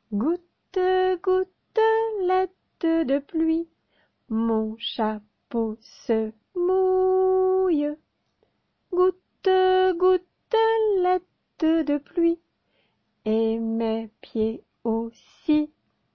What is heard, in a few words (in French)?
gouttes gouttelettes